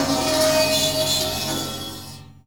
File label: RING SCAN; ENVIRN-AMB